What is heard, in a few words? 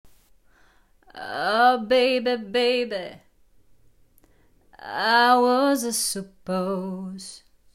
Creak